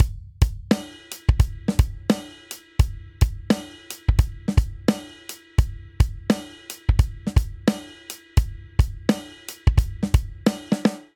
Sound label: Inbetween Hip Hop Chorus 86 bpm; MIDI Clips; 4 4; Ableton; Drum Booth